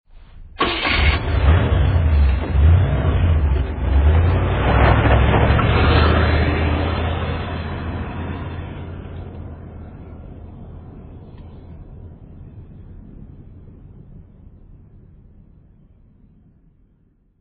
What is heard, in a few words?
Limousine starts and departs; sound effects and sound reinforcement